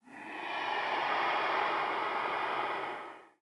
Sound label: ghost breath